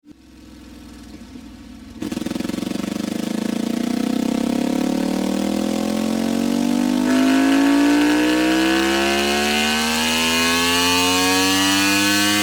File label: Desmosedici Stradale Engine with Akrapovi Exhaust; Exhaust system